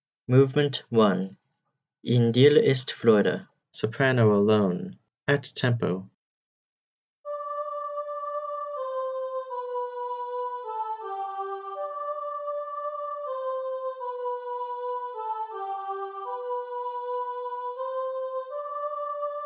soprano alone at tempo; edwards m a in dir ist freude movement 1 in dir ist freude

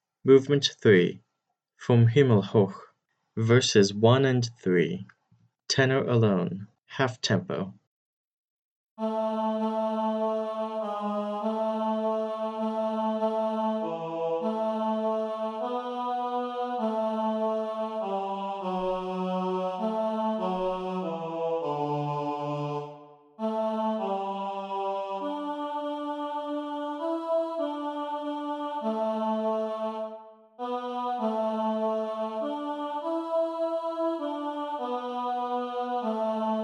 tenor alone half tempo, choir

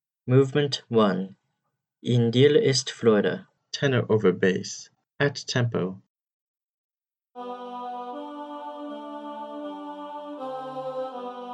tenor over bass at tempo, choir; edwards m a in dir ist freude movement 1 in dir ist freude

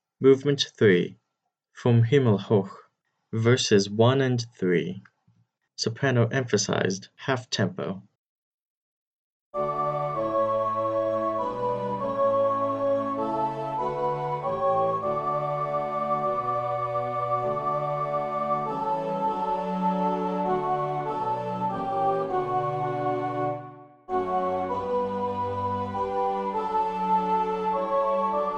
soprano emphasized half tempo, choir

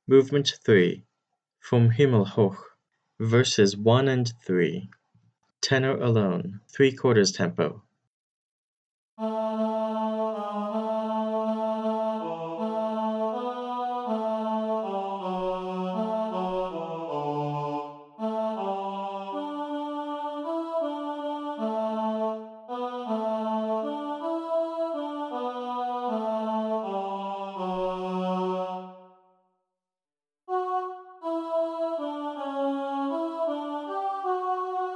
tenor alone three quarters tempo, choir

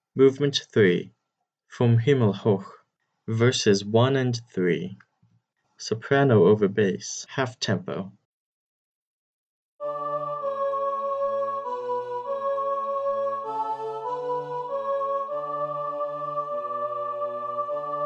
soprano over bass half tempo

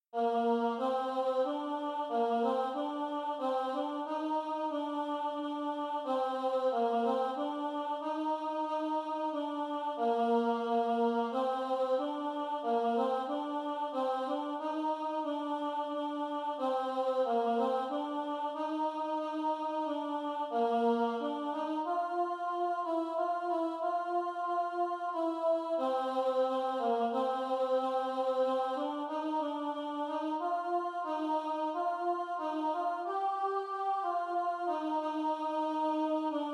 alto alone; 91 break forth o beauteous heavenly light; choir; hymnal 1982

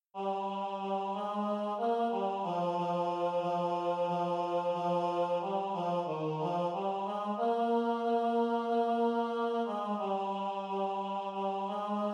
tenor alone; hymnal 1982; 91 break forth o beauteous heavenly light

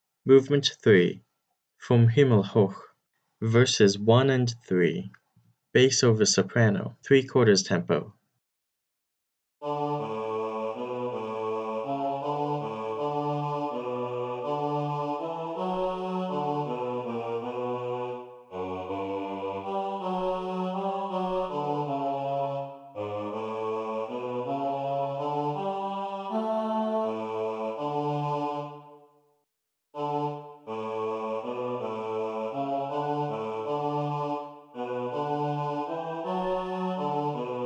bass over soprano three quarters tempo, choir

